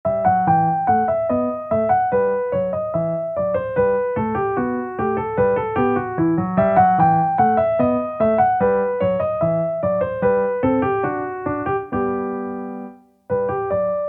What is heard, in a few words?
Bourree in E Minor, piano; BWV 996; bach